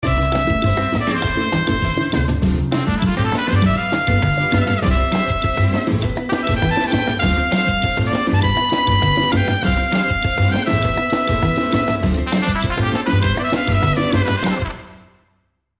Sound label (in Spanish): Mi Trompeta Ti Canta